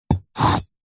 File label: industrial tool knife large metal sharp single short score scrape wood